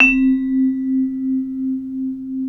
MLT VIBE

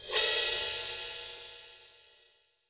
Pop Ride Phrase, Cymbals; Roland BK-5

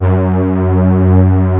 MATRIX 12, PADS; Masterbits; Vintage Voltage

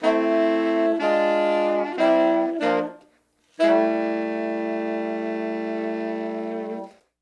Horns, 70s Analog Funk; Sonic Foundry (Sony Creative Software); What it Is!

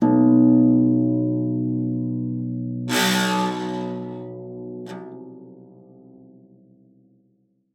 PEDX A#1, HARP; Xsample VOL-8; HARP+FX

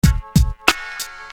Beat, Hip-Hop Street Beats

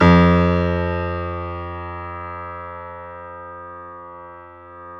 PIA GRDPI, PIA_GRAND, Roland

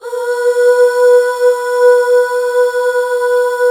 UUHH B4; Best Service Gigapack 1

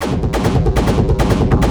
Beat, Industrial

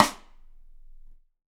25 4X15 XST R, Drums, TUCSON KIT; Big Fish Audio; Ross Garfield Drums VOL-2

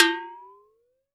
WATERBEL, WATERBELL; Univers Sons - Raricussions

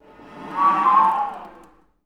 FL FX, Orchestra; Best Service; Peter Siedlaczek's Advanced Orchestra

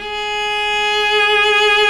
F#3 SOLO CELLO

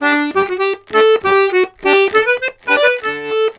Accordions, BOUREE; USB Soundscan vol.40; AKAI

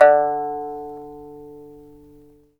PIPA D4 P; Spectrasonics; Heart of Asia

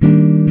GTR CHORD; Univers Sons; Jazzistic

